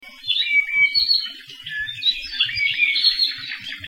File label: apapane call